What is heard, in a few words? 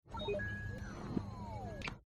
xindi control lights